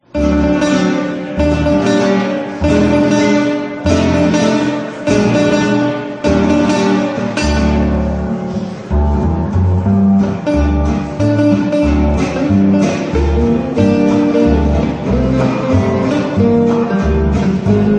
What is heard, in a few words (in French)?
douce Ambiance